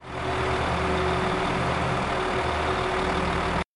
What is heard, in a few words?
crane